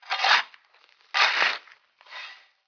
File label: dig; Zeus